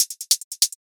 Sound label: Drill Hihat Roll; Cymatics